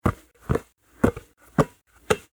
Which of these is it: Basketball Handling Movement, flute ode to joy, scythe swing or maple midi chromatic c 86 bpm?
Basketball Handling Movement